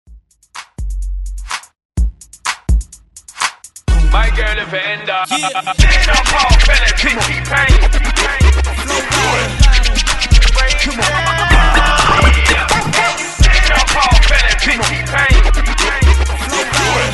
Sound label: Scratch Re Drum 126 Bpm; DJDOLLS